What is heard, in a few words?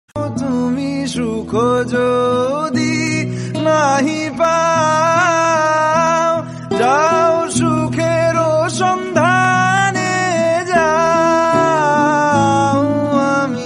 amaro porano jaha chai ringtone rabindranath sangeet ringtone